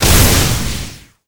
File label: Normal Shot Gold; disney star smash; android